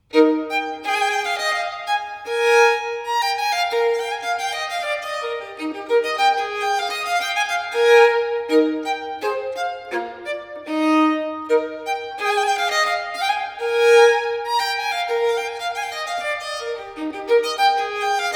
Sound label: Violin Partita No. 3 in E Major, Gavotte and Rondeau; BWV 1006; Bach